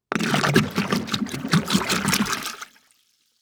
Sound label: Liquid Water Water Jug Bubbles In Bucket Of Water